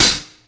Closed Hi-Hat; KJ Sawka; Drum n Bass & Beyond; Drum Tool Box

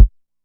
KORG 01W KIT; Rubber Chicken Software Sample Bank

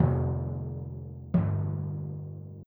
Timpani, Orchestral; Sonic Foundry (Sony Creative Software); Orchestral VOL-2; Modern 25

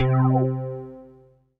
Dance Floor Bass, Multisample Basses

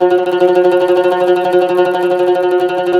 CUMBUS TR, Cumbus Tremolo

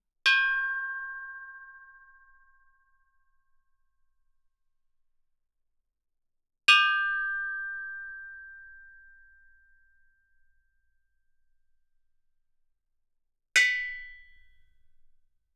Gamelan; Propeller Island - Complete Gamelan

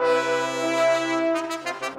Brass

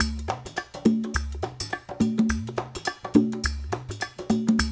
percussion loop; InVision Interactive; Percussion Slam; SAHARA

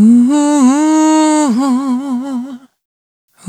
Multi Voice Loops, Vocal Samples; Sonic Foundry (Sony Creative Software) - Pocket Diva World Class Vocal Samples

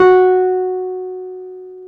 PNO Wurly F#5; Keyboards of The 60's and 70's

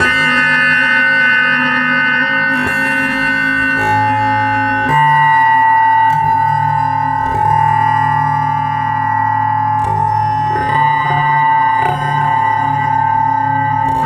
Residual Drone; Sonic Foundry (Sony Creative Software); Loop Noir

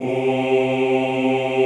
OOH C2, Classical Choir; Best Service; Extended Classical Choir

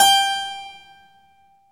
KEY HRPCR, Roland; Roland - LA Composer vol. 1

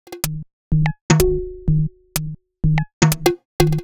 Top A# 125bpm, House Drumloops; 90 s Sample CDs; Zero-G House Fabrik Drumloops; ZG Drumloops

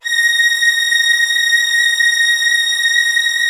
LDFV A#6, LOUD VIOLINS; Q Up Arts; Denny Jaeger Master Violin Library

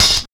OP HAT; Phat & Phunky; East West

